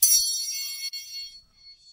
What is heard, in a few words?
bell plate